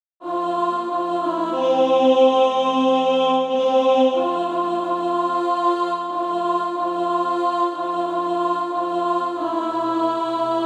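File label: alto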